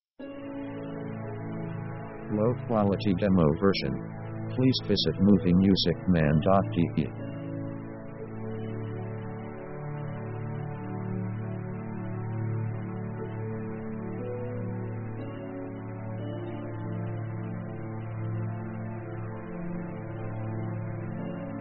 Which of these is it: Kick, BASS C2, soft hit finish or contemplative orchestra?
contemplative orchestra